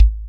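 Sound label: Shell Kick, Boss DR660, bd; drum machine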